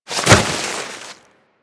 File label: Generic Roll Snow; Characters; Warframe